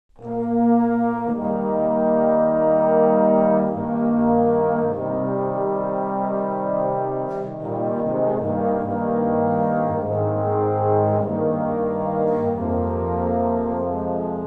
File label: brass ensemble